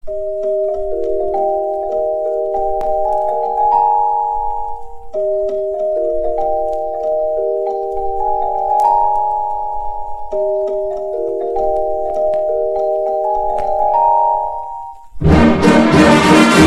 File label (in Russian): futbol nyy marsh